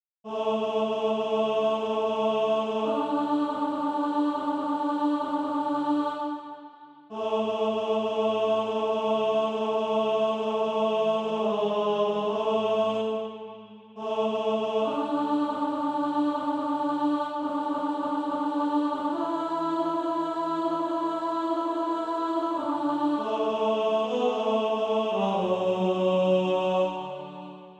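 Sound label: tenor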